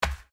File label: wood sound